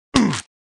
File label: hit death male; Temple Run 2